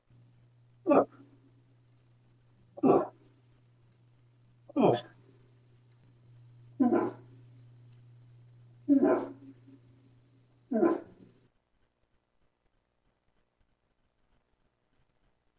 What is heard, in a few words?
call buck; Wikwemot